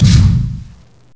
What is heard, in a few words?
door